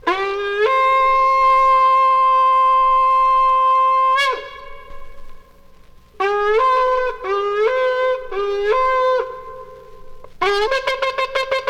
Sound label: Yom Kippur Shofar blowing; Jewish New Year 5782